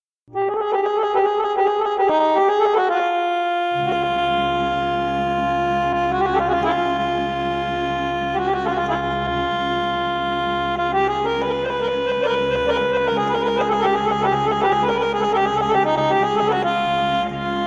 slow melody and strandjanska rachenitsa, Bulgarian folk songs and dances